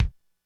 Kick, Korg DSS-1 Drums

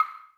Lo Wood Block; Zoom RT-123

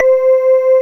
VELIMBA; MORPHEUS

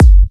Electro Kicks

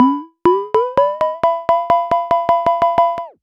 Multi-Bell Loop

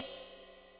ride, Millenium MPS-400

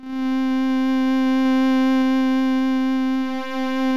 synth, amiga med

